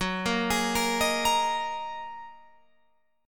Gb 6th Add 9th Gb down Guitar Open F; Listen to Gb6add9 arpeggiated

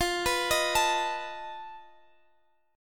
F 7th Flat 5th F Guitar Half Step Down

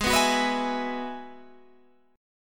Ab Minor 7th Sharp 5th Ab, down, Guitar, Open G; Abm7#5; strummed

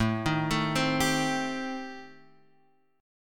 A Minor 7th Flat 5th A; Am7b5 arpeggiated; x,0,1,2,1,3

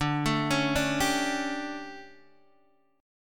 D Major 7th Suspended 4th, Guitar, DADGAD

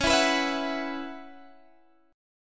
B Suspended 2nd Suspended 4th

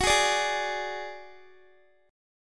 Gb Suspended 2nd Sharp 5th, Guitar; Gbsus2#5 strummed; x,21,18,19,20,x

